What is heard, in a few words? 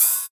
D&B Open Hat, Drum & Bass Kit; essential drumkit